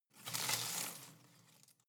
home plant leaves rustle regular

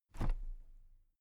toolbox place upright soft

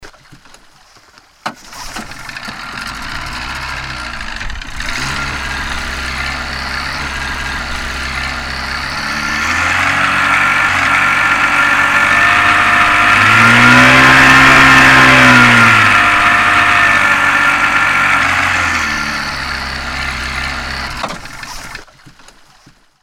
boat swimming and stop

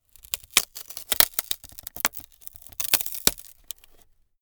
wood splinter

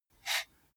computer mouse draw short

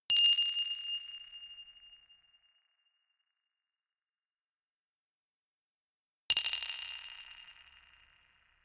notification glockenspiel rattle single high

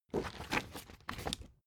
spring binder open

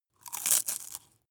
bite biscuit

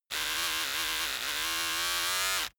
servo trembling

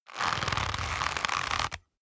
leather creak medium

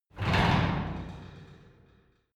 garage door close short hard

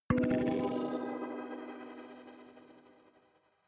notification kalimba magical body hit

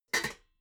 pots clank quiet

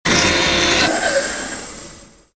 hydraulic door

